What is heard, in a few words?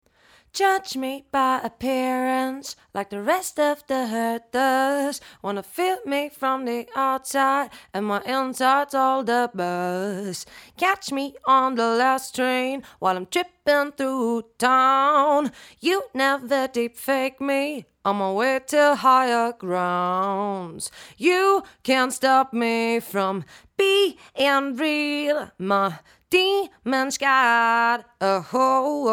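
lct 1040 female vocal warm tube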